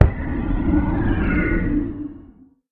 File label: elder death, guardian; mob; minecraft